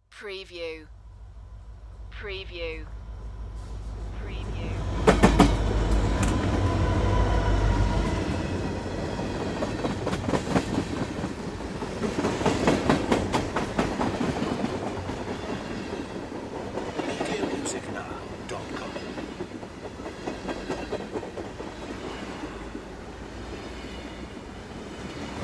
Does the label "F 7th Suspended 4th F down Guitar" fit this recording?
No